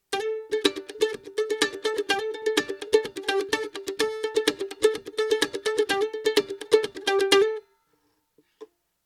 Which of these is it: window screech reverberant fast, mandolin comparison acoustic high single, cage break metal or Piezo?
mandolin comparison acoustic high single